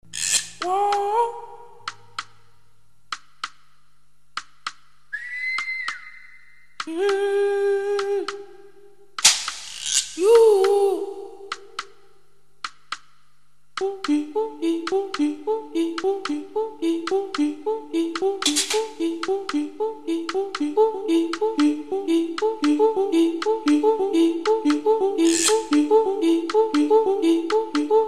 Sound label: triangle